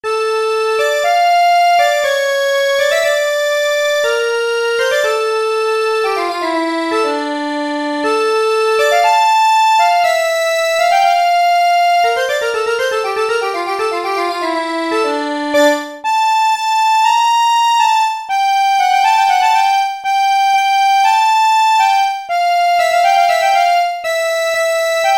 hungarian dance, accordion; brahms

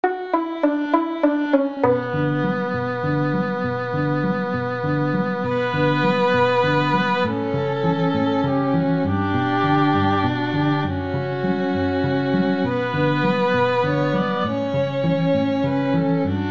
spanish violin, 2 violins cello

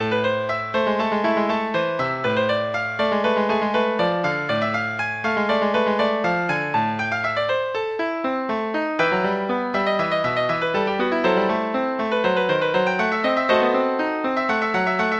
Praeludium, piano; BWV865

